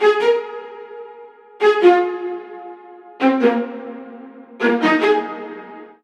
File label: STACATO CINEMATIC STRINGS; Melody Loops; Crowdsourced Sample Pack; TRUTH-HZ